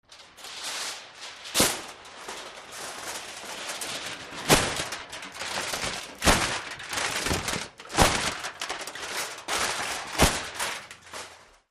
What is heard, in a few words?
Muffled Thump, aluminum